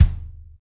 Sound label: Folk Kick; Roland BK-5